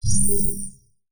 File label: magic